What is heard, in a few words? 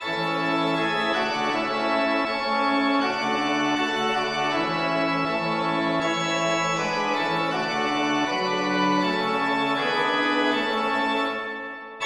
polyinstru; hymns; Himnario grande